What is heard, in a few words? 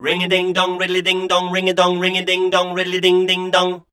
SCAT #F, Voice